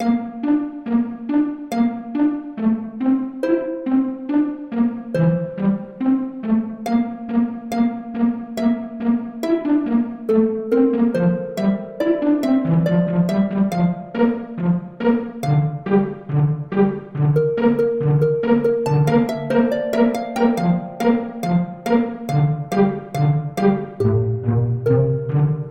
Strings and Orchestral Harp

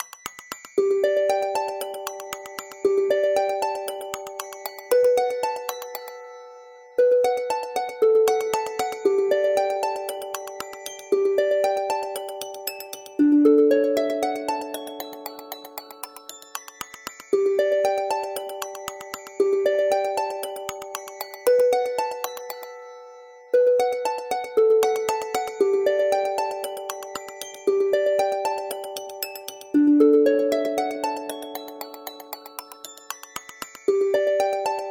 Game Over

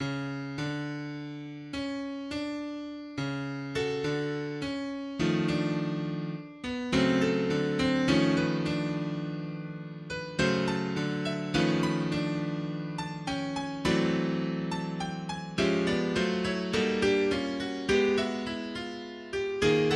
Prelude in C sharp minor

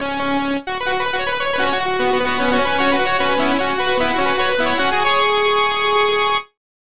Victory Over Boss Boss Death

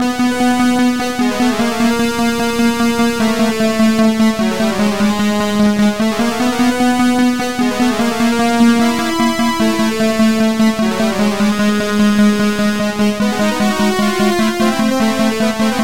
Boss Music A

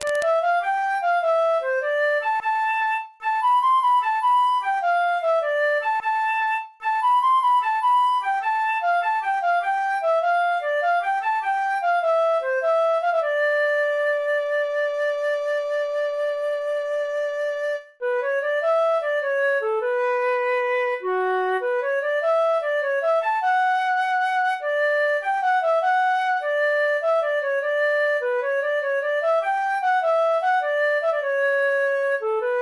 Flute Solo

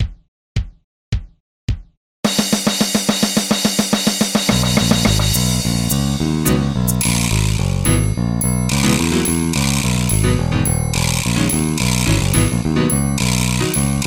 Unswung Jazz

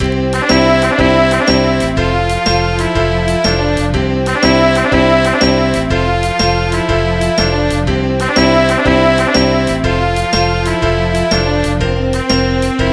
Carpet commercial background music

